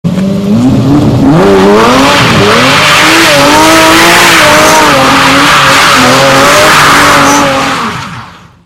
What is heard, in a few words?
Murcielago Burnout